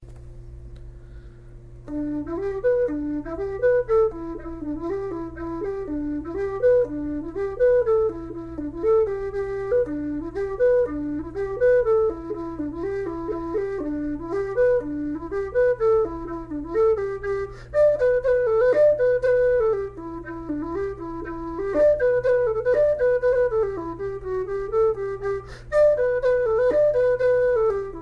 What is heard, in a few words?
polka slow